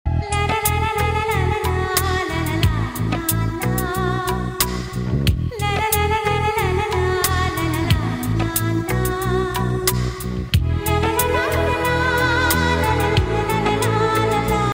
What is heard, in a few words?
instrumental music; roja